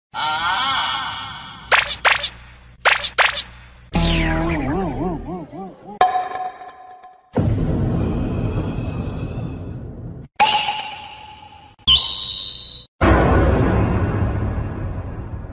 anime sound effects ringtone

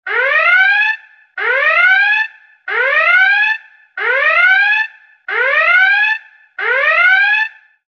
emergency alert system ringtone

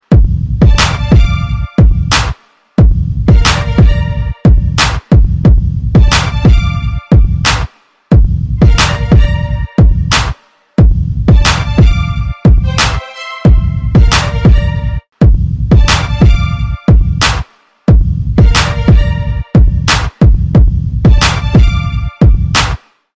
beat ringtone

